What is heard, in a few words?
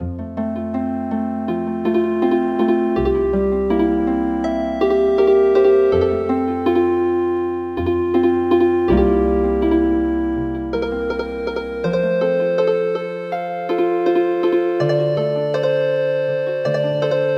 Healin Harp